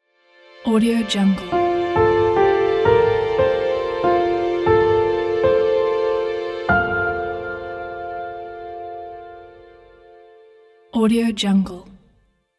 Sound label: simply piano logo; noisehoop